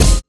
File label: Sound FX Scifi Drum Kick